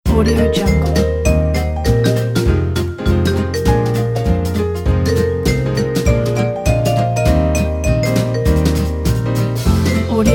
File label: enchanted swing jazz